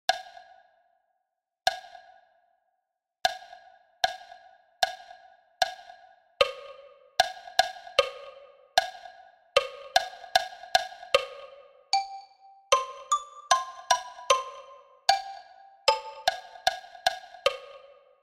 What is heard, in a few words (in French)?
Au trot a 76 bpm